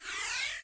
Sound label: launch; World Of Goo